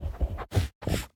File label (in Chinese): 搜寻声; 嗅探兽